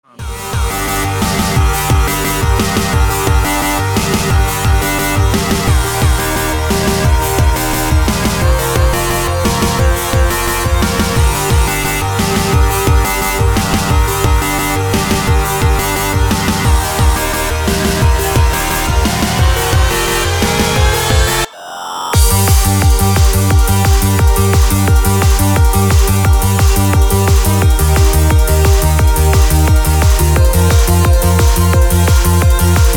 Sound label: psychedelic goa trance